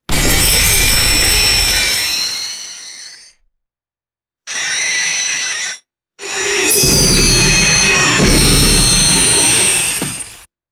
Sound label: intense metallic robot screeching blasts